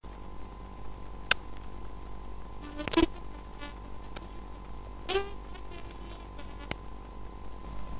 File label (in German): fliege; die Kassettenfliege; die Fliege von der Kassette